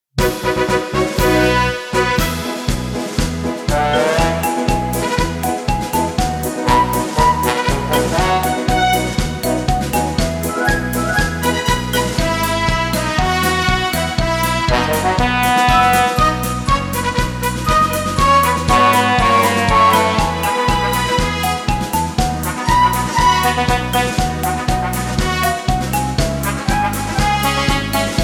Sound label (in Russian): минусовка